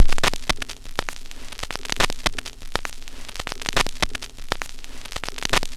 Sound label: Record Noise, Korg M1